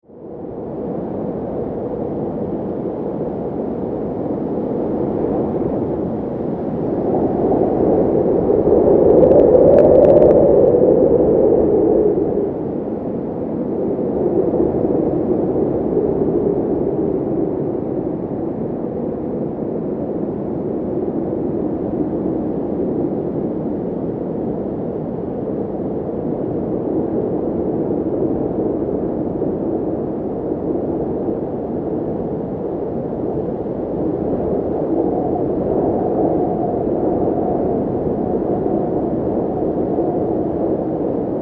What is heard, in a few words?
Wind Storm Cold; 5.1